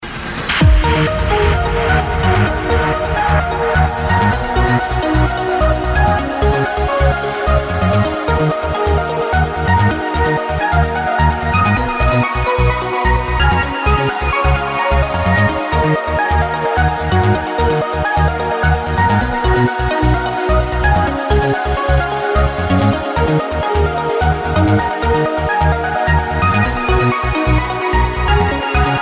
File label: Techno Tune